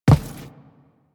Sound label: Footstep Default; Warframe; Railjack Crew; Steel Meridian